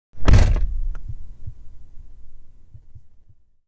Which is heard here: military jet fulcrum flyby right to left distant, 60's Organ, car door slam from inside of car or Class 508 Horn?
car door slam from inside of car